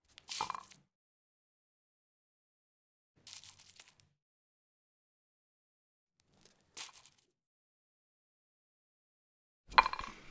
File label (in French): CENDRIER METAL CIGARETTE ECRASE ETEINT